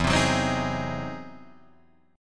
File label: E Augmented Major 9th, Guitar, Open D